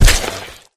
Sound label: elite bodyfall; flood